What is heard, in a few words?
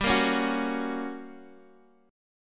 G Minor Major 7th Flat 5th G, Guitar Half Step Down